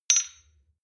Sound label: shot glass clink hard, gastronomie; berufe; soundpool